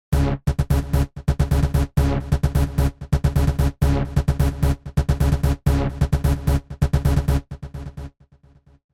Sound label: Bass